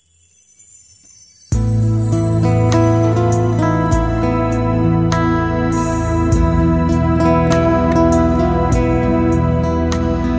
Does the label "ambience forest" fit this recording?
No